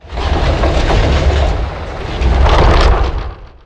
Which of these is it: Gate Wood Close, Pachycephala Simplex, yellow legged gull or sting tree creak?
Gate Wood Close